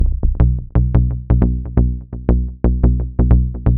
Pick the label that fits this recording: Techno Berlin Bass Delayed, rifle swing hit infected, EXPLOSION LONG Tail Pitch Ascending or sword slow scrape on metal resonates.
Techno Berlin Bass Delayed